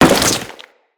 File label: Crewman Step Flesh, Footsteps; Corpus